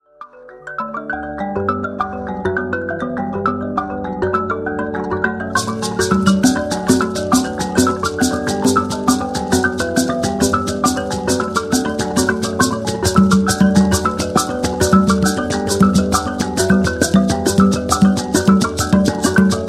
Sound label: Mbira